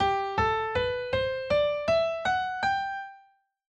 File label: Major Scale G